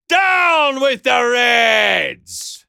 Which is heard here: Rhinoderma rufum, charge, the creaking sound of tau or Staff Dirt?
charge